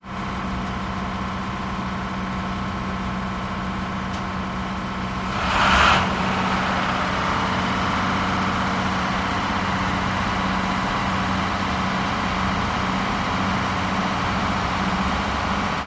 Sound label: marine engine idle; engines